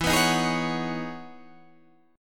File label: E Major 13th down Guitar DADGAD